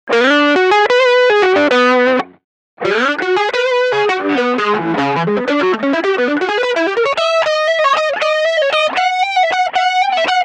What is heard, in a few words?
Stereo Dynamic Doubling